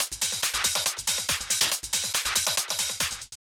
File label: Loop 140BPM, Drums & Perc; Vengeance Samples; D minor